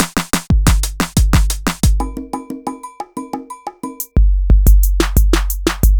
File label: Groove 90bpm; GroovePizza